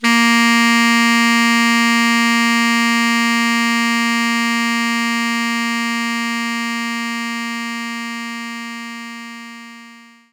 Tenor Sax Bb2; knights try

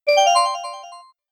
Correct Solution; super spamton 64

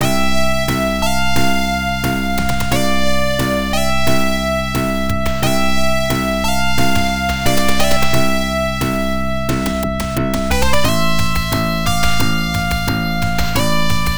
A Victorious Bell is Ringing; pc-88; Herzog

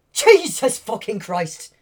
Male Reactions

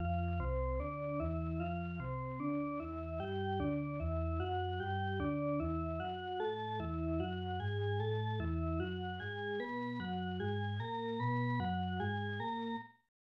Organ Music; NHL Stanley Cup; SNES

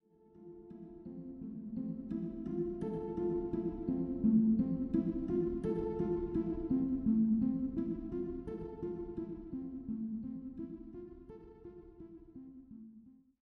85bpm; Legends of Pegasus; windows gamerip 2012

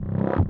Oneshot Wobble

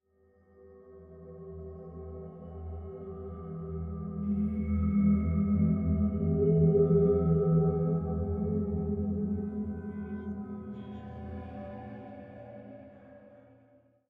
Idle, 85bpm; Legends of Pegasus; windows gamerip 2012

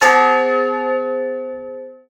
Old Clock

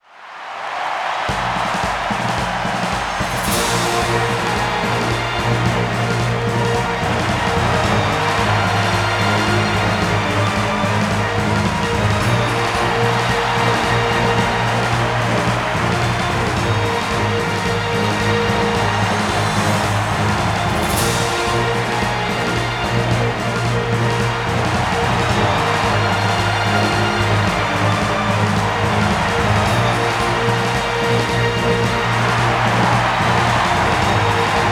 Victory Semifinal

